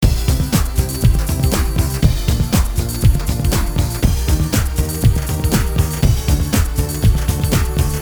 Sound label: depressing rock music